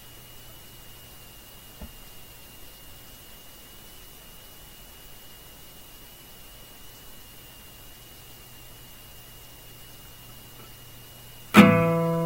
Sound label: Acoustic Session; Bloody Birds